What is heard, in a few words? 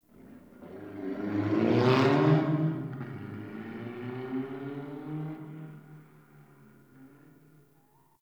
Distant Traffic B; Warframe; Ambience; NineteenNinetyNine